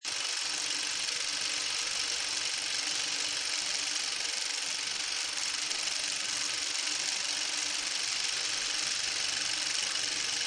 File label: projector